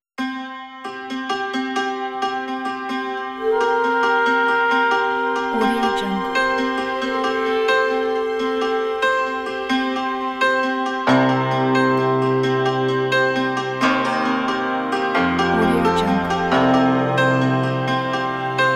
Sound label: folk ethnic cinematic fantasy